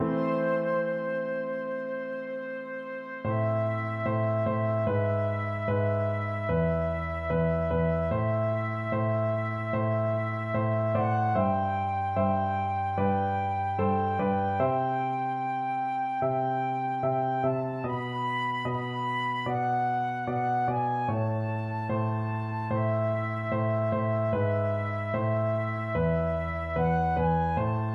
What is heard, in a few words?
flute